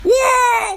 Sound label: crash shock whoa